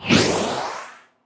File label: Message Send Out; Xiaomi Mi1; ui